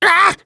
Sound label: Witch Doctor hit